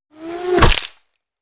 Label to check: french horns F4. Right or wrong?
Wrong